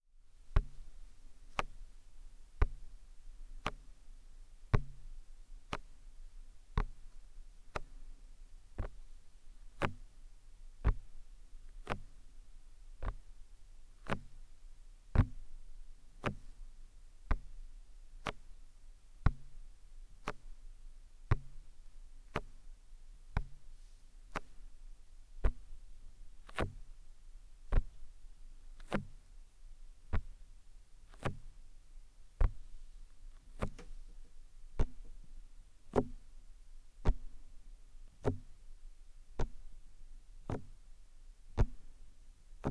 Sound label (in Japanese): ゆっくりタッピング